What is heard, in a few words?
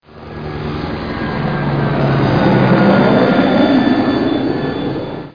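helicopter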